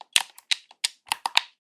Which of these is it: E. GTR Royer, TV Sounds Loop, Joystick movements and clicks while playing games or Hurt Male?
Joystick movements and clicks while playing games